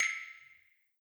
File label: person new small